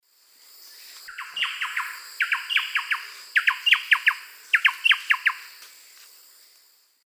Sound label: Campylorhynchus turdinus, duet; S. America; Eastern Lowlands